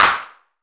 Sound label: small reverb hand clap sound d sharp key